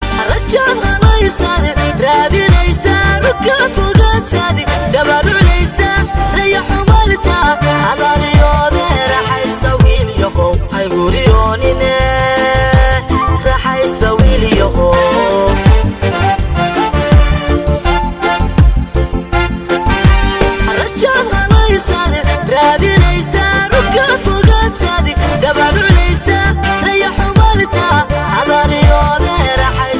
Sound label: Somali Song